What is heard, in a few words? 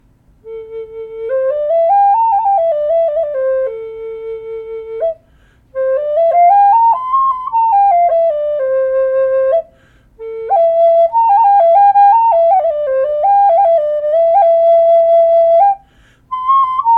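A4 Corkscrew Willow branch, Native Style Flutes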